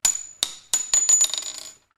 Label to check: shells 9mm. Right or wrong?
Right